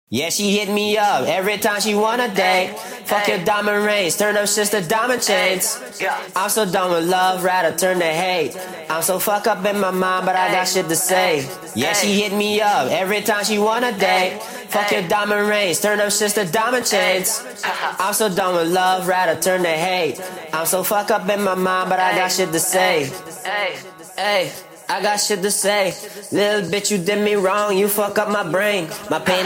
hip hop 165 C sharp, acapella

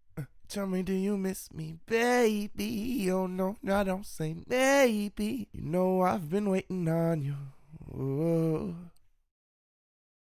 afro electro RnB 90 Cm, acapella

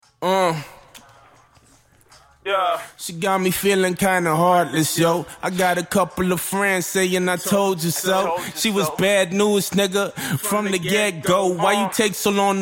hip hop 158 Gmin, acapella

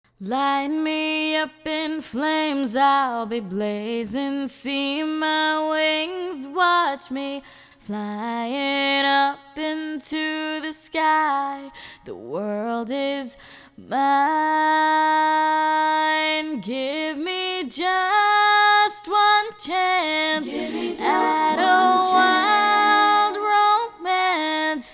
acapella, pop 122 Gm

